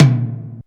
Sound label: drm, a4, EMU SP 12, DRUMCOMPUTER